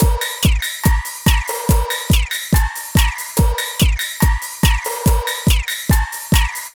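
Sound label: drum loops, right; Big Fish Audio; Prosonus 500 Drum Loops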